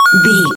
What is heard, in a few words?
retro collect pickup coin